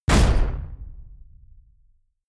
sci-fi space pirate gun fire bright; pistolen gewehre

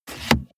car bmw e46 interior sun visor mirror slide hard

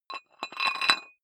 dumbbell thread put on weight soft, fitnessstudio

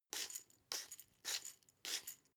glass pepper grind smooth sequence; berufe; gastronomie; soundpool

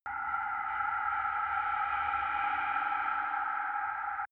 ghost shuttle, raumschiff

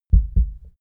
heart beat double

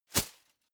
whoosh paper scrap flutter hard; swooshes-swishes

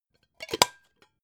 pot lid close plop; objekte; topf

